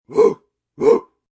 Dog Big, Dog barking, big dog, short (human voice); Animal; Dogs (Sound Effects:Animals)